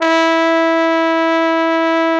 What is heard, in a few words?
TROMBO; Trombone